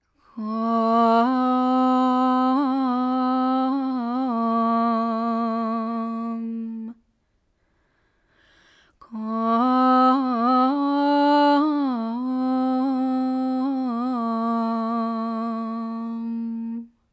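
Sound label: female vocal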